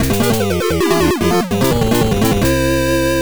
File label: Battle Won; super metal crusher; pc engine